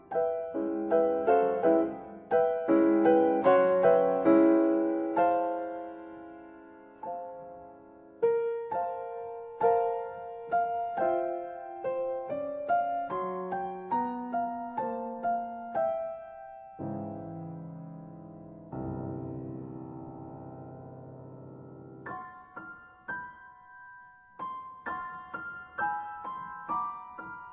Scaramouche Variations V Brillante

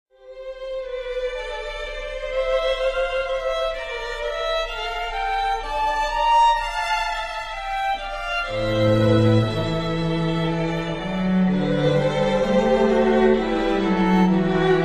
Wedding Anniversary Music for 3 instruments; Cowell; Anniversary Piece No. 55

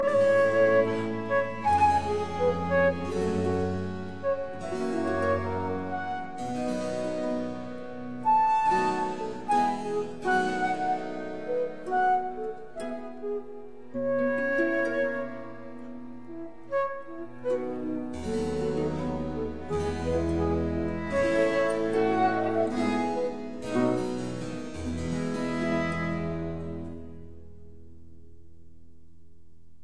Quartet Adagio; Quatrieme livre de 6 quatuors; No 2 in F major; TWV 43F1

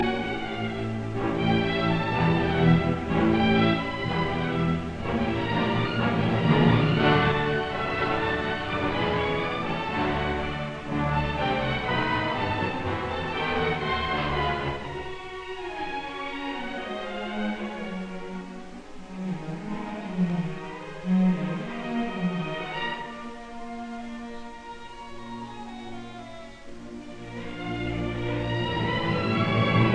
Symphony in D minor, Allegro non troppo; Franck; Leopold Stokowski

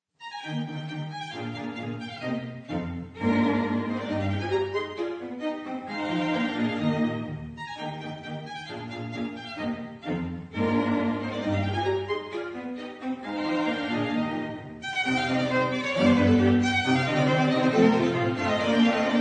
Viola Quintet in E flat Finale Allegro

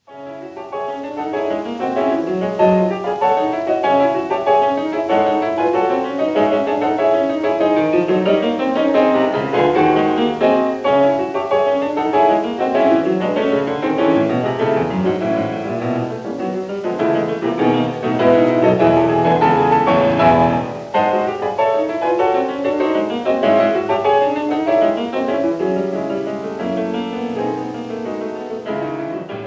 Etudes for piano Variation; Schumann; Symphonic; Op. 13

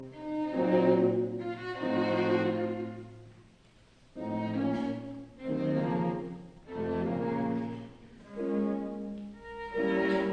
Piano Quintet; in E flat major; In modo d'una marcia; Un poco largamente

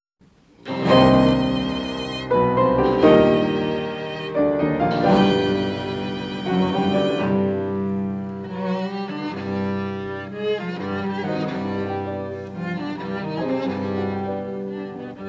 Trio No. 3 in c Op. 101 I. Allegro energico; Brahms

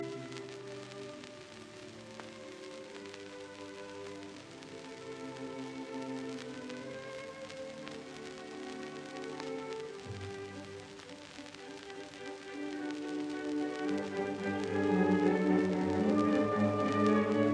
Piano Concerto in G major Allegro moderato; Op 58; Beethoven

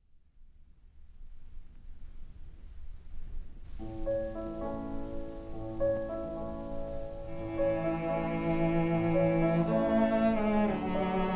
Piano Quintet in A Allegro ma non troppo; Dvorak; Op. 81

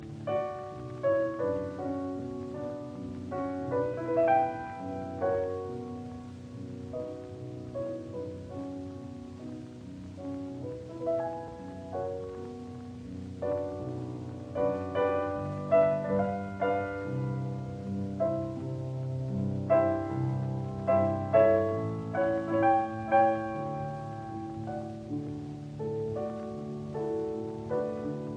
Ballades in D major; 4 Ballades Op 10 No 2; Wilhelm Backhaus

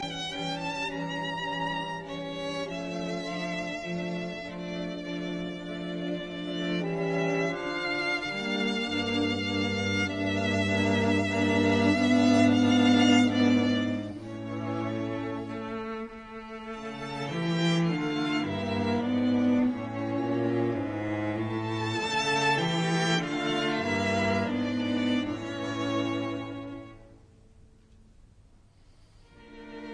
String Quartet in F major, Adagio affettuoso; Beethoven; Op 18 No 1

